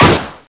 damage, car; q3rally

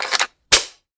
hmg clip in, weapons

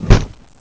barricade destroy; nzp

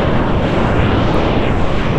portal; q3rally

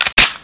slide, weapons, colt; nzp

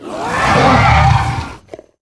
charge kill, weapons

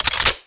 hmg clip in, weapons

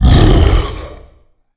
fall pain; player

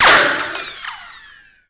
ric, weapons

